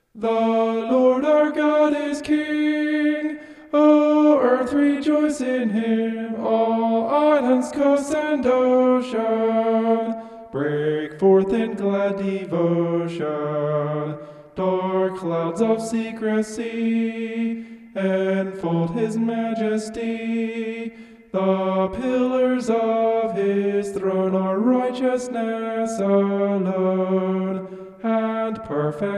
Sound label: vocal homoph; psalm 97